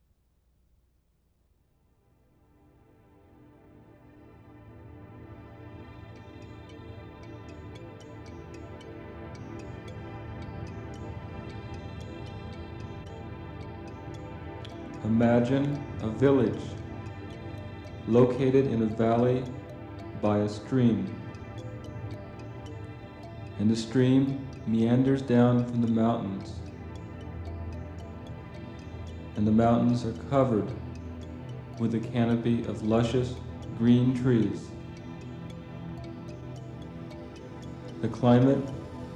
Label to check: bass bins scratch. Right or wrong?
Wrong